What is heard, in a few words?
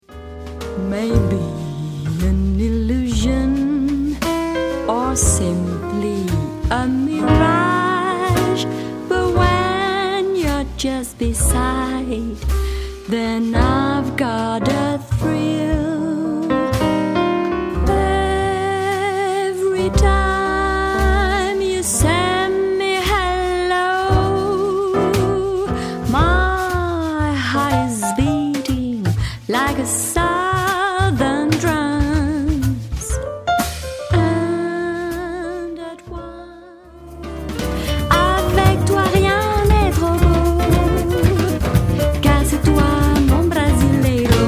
medley, swing